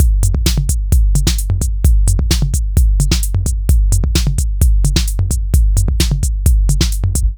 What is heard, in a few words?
Sub Rolls, Digital Breaks, 130BPM; loops; Loopmasters; Jon Carter & Alex Blanco; Block Party Essentials